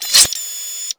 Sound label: blades in, roller mine; hl2